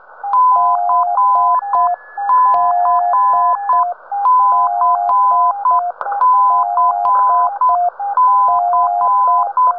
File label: Single letter beacons on 7039 kHz